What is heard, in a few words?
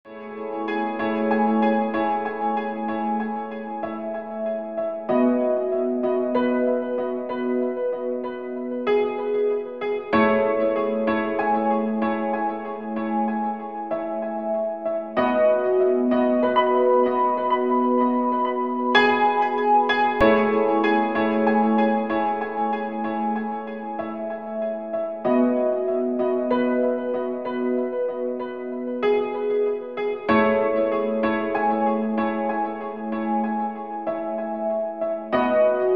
loop sunrise